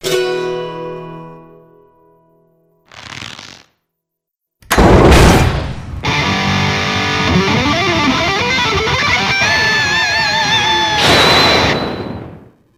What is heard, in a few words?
transform; metalman